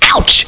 ouch

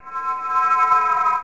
Noise

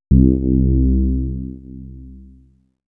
demon bass

